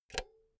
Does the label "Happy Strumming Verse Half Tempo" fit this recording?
No